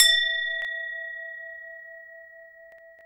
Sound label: GLOCKE, GLOCKEN; SoundBytes - Early Patches